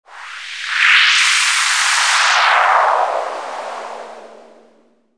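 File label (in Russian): волшебное заклинание волшебника; izmenit interaktivnij zvukovoj effekt igrovoj zvukovoj effekt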